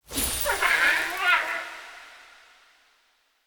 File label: Beaver Mote; Animal Motes